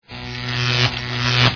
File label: neon; GameplaySequenceDemo